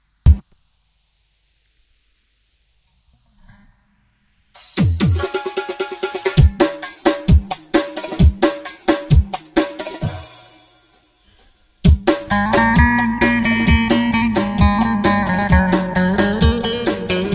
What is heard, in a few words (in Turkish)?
elektro baglama